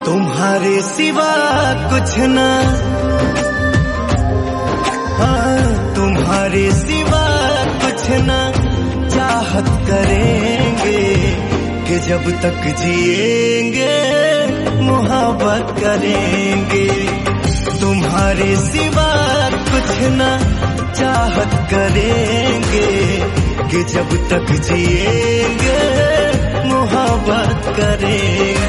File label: ringtone hindi